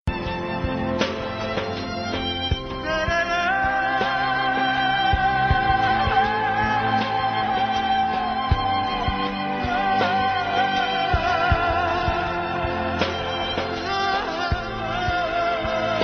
emotional love

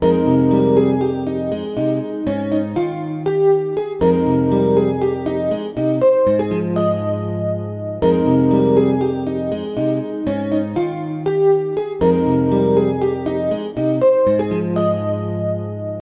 clean guitar melody

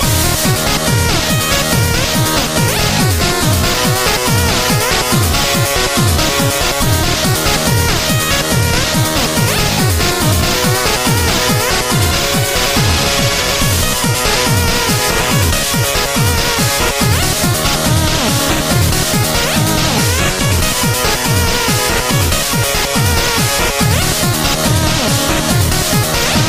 8 bit

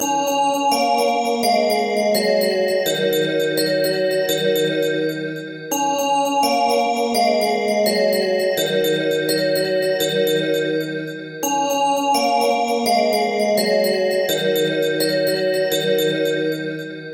Crystal Bells

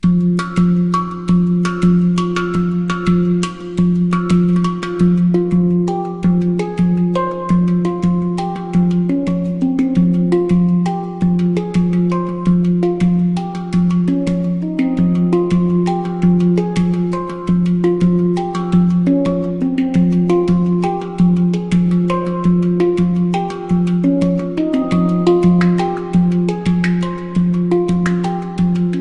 new hang tone; ringtones

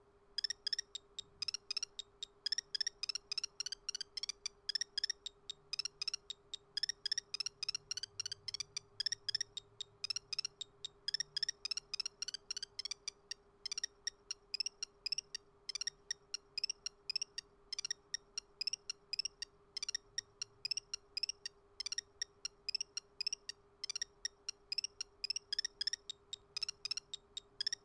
Crickety

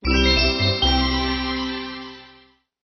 1UP; Sonic Adventure

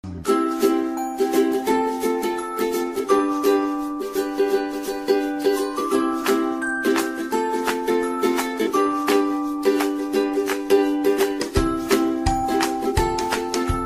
CUTE LYRE

